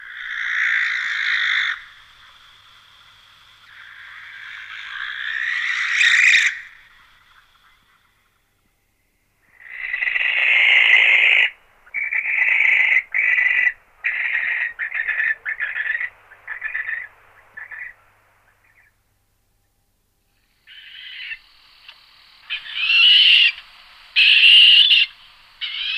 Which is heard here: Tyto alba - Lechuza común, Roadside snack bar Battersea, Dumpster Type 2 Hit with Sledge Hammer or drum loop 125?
Tyto alba - Lechuza común